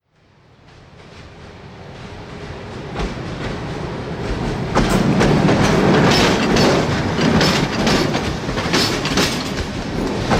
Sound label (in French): bruit joints de rails; sonotheque